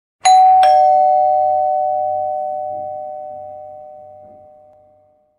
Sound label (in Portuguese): Campainha